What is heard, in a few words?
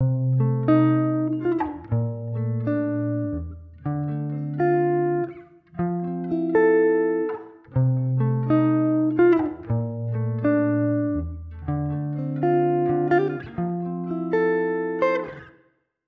Chamber reverb example, guitar